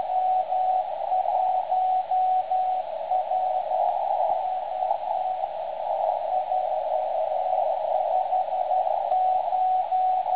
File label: websdr sweden 3579.1kHz, Beacon recordings